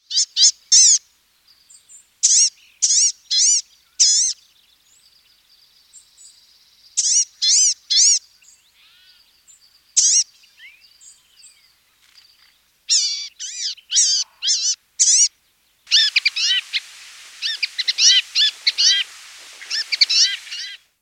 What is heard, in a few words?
Blue-gray Gnatcatcher; Birds